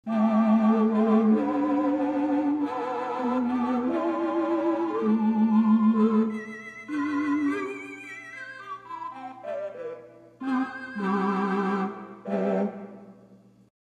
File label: 8 String 2 2/3 Tibia, Called 'Synthetic Oboe' on some small pipe organs; theatre organ